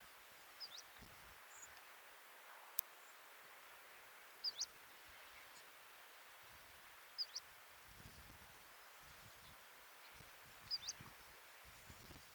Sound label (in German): tienshanlaubsaenger